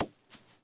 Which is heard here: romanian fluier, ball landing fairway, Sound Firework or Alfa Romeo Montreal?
ball landing fairway